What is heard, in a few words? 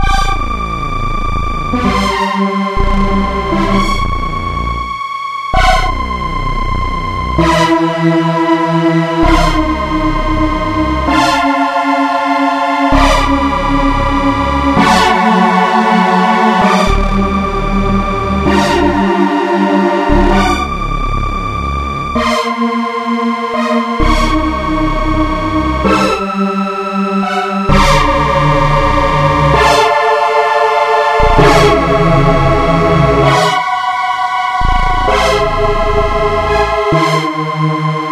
Creepy BG Music